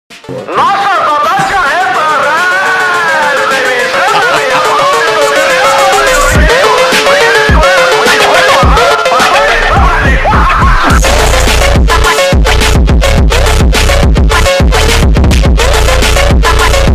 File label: phonk